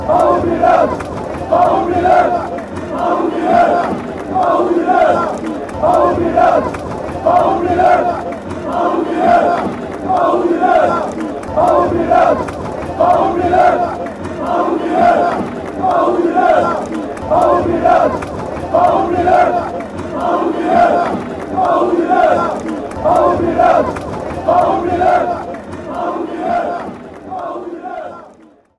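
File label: sao paulo chant, football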